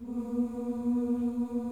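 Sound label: C3 FEMALE CHOIR; CHOIR OOS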